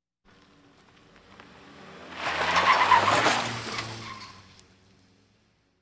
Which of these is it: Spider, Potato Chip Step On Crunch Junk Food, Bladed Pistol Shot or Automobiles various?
Automobiles various